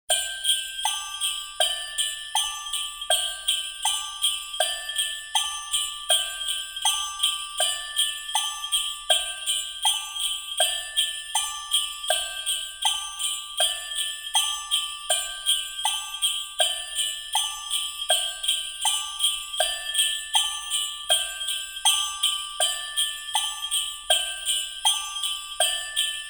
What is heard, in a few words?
Christmas Jingle Glockenspiel Bed; kingfisher christmas samples